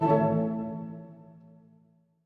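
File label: orchestra; Masterbits - Switch FX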